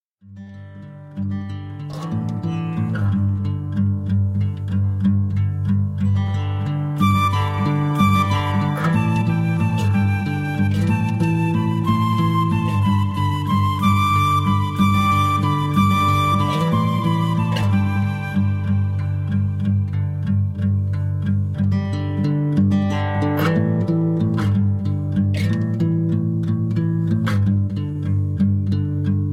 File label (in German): Kuckuck Kuckuck Begleitversion